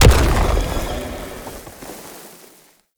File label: Octopede Unearth Proj By Explosion; Warframe; ManInTheWall